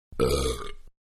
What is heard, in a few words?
Burp Soft; Burping; Human; Manhattan Production Music